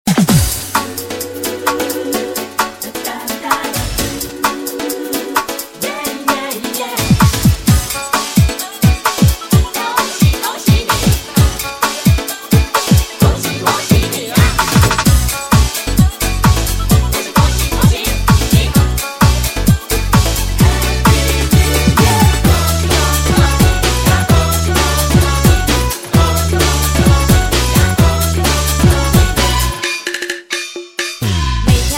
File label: chinese new year rehearsal song